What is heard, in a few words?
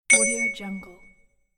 new message cling; audioheart